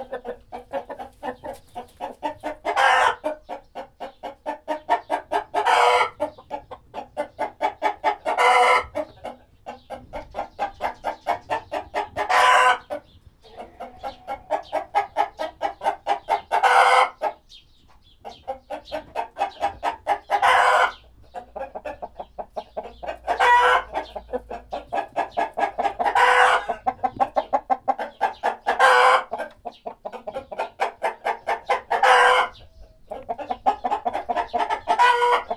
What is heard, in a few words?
chickens clucking with birds in the background; soundscapes